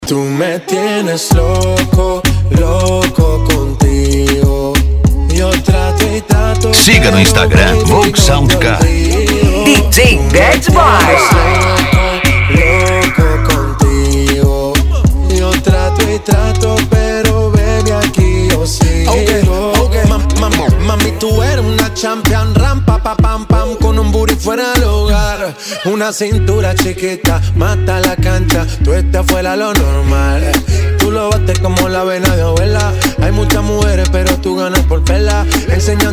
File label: sound car reggaeton; cd volks sound car